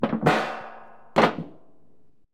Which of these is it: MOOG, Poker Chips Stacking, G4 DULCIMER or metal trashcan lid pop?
metal trashcan lid pop